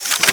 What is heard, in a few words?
Arch Dual Pistol Reload Mech; Warframe; Archwing